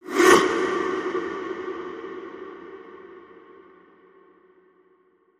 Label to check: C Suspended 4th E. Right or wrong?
Wrong